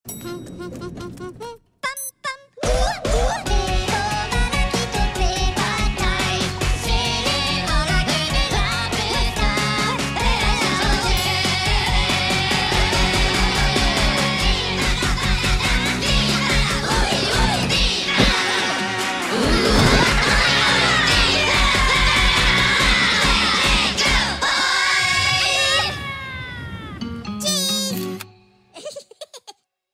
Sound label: Smart Phone; Vivo; Minions